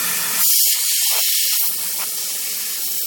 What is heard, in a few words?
hose